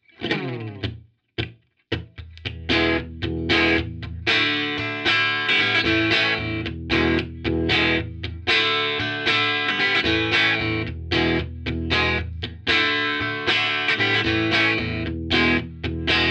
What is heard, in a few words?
st white s clean rear